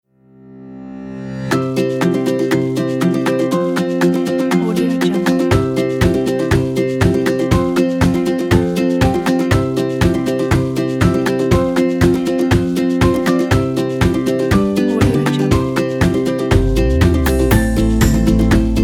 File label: happy music